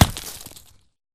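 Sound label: Bullet Light Ballistic Dirt impact; Titanfall 2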